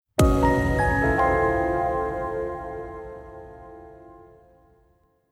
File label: Level Up Jingle